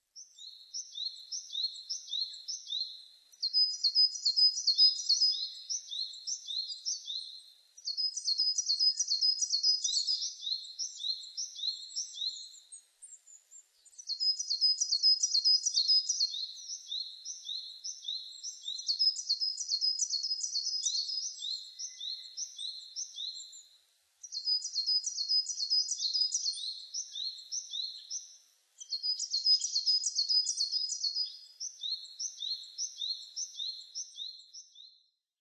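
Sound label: coal tit; higara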